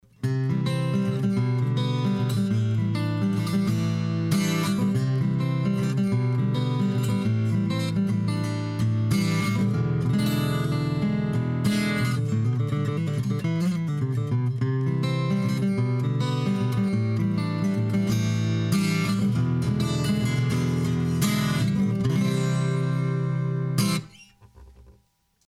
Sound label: AGit PreAmp EQ